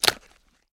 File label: Bone Crab Crunch Snap